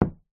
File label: sport pool ball set down on pool table